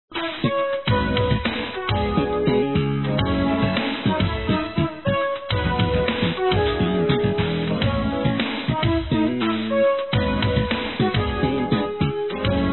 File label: chime